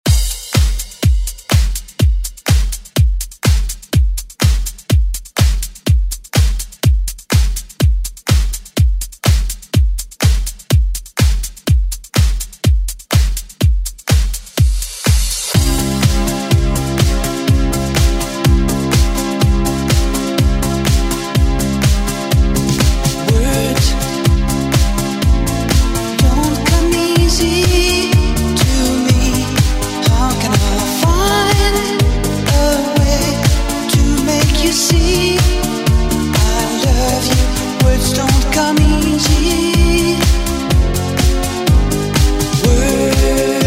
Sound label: Re Drum